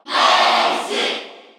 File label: Cheer Korean; Marth; SSBU